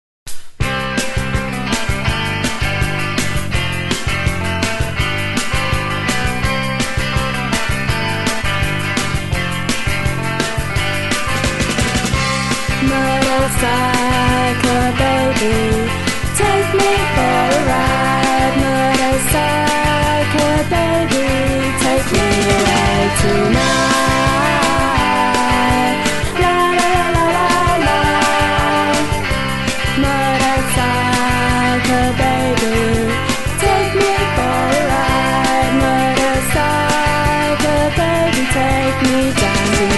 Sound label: Motorcycle Baby